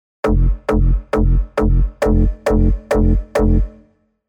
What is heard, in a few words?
Offbeat Donk, techno bass; Spire; revealed spire techno bass vol 1